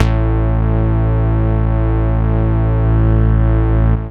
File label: MOOG BASS; SoundFactory